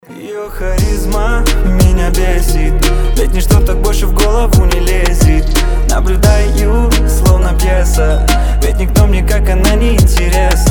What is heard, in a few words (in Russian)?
rington na zvonok telefona